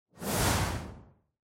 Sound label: Camera Motion Mid; シーン・カメラモーション